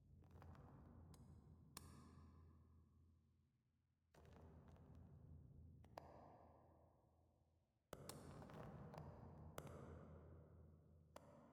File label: mines stereo loop